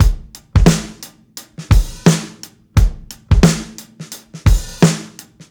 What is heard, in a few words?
SWINGY, breaks, drums; Basement drums vol 2